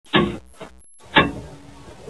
clock; svencoop; justmansion

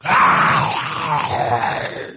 zombie alert; svencoop; custom monsters

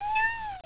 smash, roach; svencoop; biglolly coop

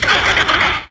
condor pain; npc; svencoop; morass beta

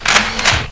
old rifle pickup; svencoop

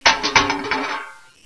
metal, debris; svencoop; aomdc

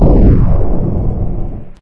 claw miss; svencoop; custom monsters; necroscasm

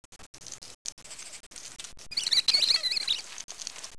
roach walk; svencoop; sence; mistake coop

